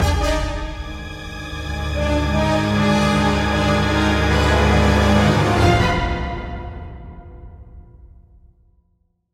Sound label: Stinger; Pub Brawl